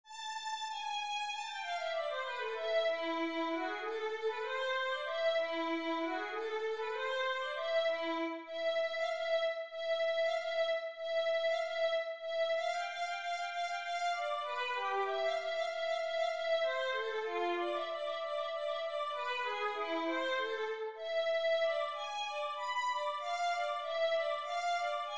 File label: concerto a minor Violin